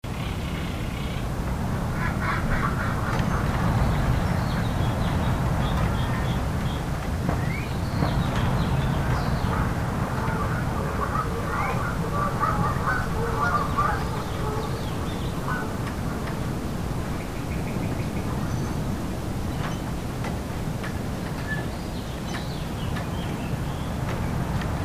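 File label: Shed Shack Pond, ambience bed loop; the unfinished swan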